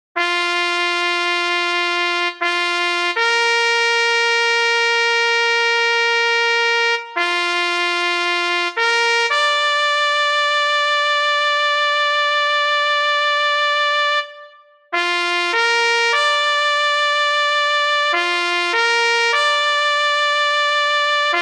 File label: Taps